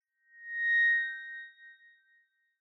Megaphone Feedback Reverb

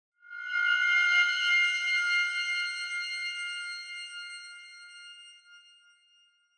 Horror Accent, Long

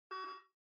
OS Sound (Speaker-Notification)

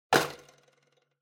Kitchen Scale Main Unit Put

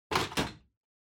Vacuum Cleaner Stand Put

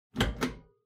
Ceiling Light Pull

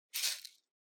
Spice Mill Salt Shake

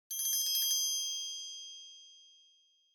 Triangle Roll Short

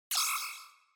Single Accent High

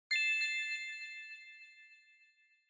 Bell Accent, Low Delay

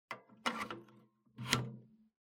PC Hardware CF Card Insert

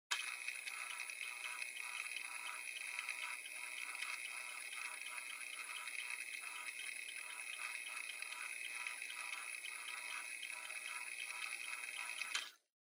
Portable Stereo Driving Noise MD Search

